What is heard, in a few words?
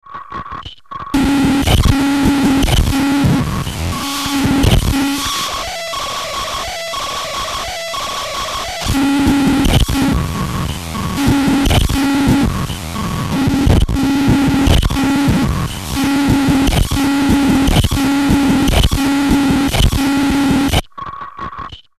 buzzbox